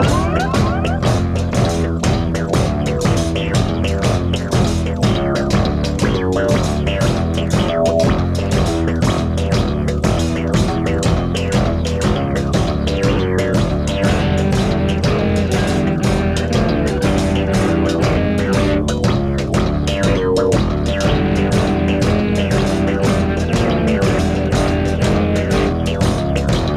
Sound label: Music Inner sanctum Fight beat